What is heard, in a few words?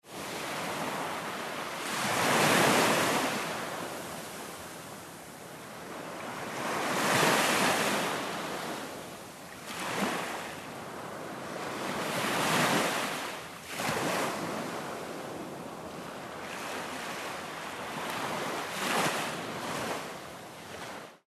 breaking waves